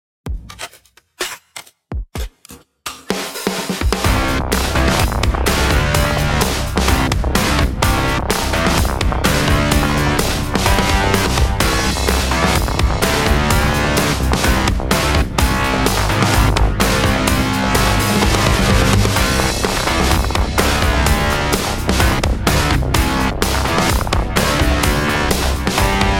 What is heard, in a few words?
Distortion